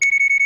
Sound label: five beeps; Cyberpunk BreachHaxx0r; elderscrolls; eso; ability reminder